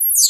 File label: alien; animal